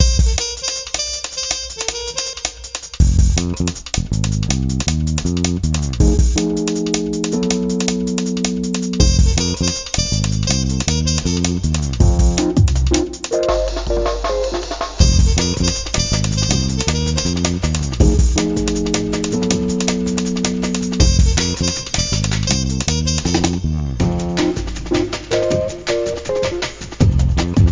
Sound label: Jungle; Sheep; sheep dog wolf; Musics